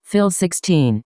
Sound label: Fill